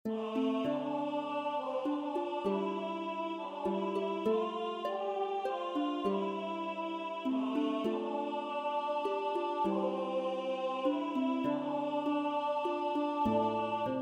no vocals

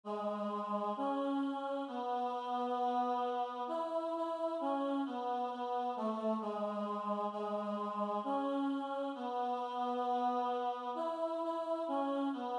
tenor; Wardens of varushka

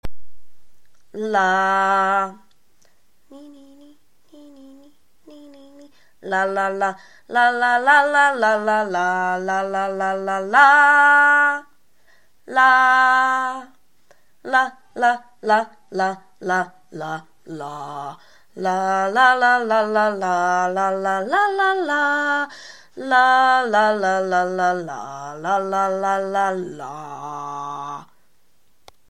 bass

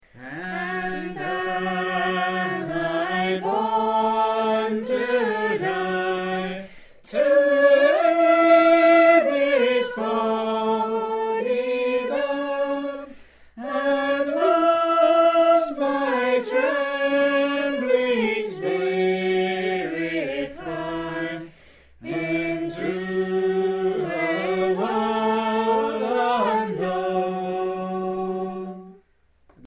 tenor; idumea

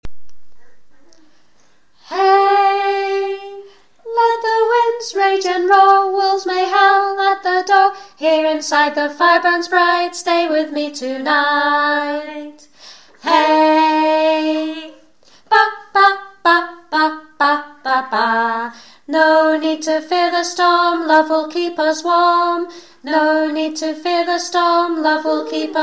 alto words

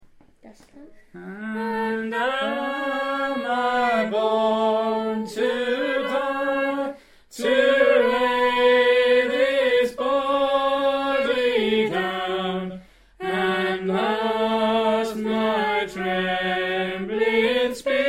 treble; idumea

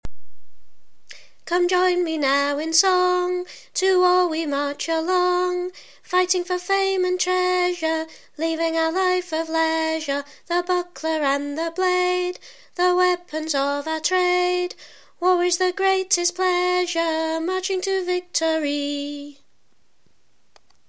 League alto

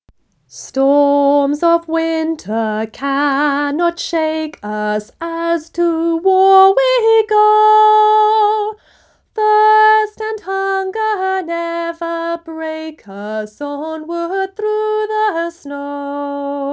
Marching Song

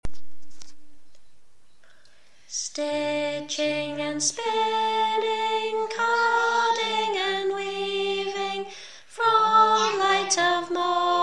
Day song melody

